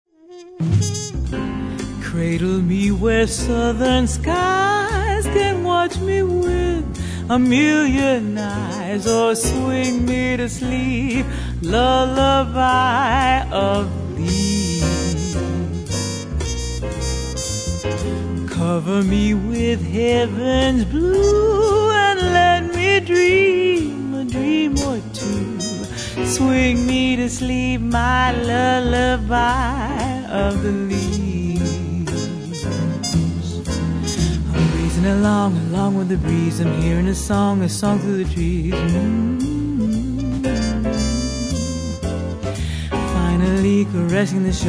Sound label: lullaby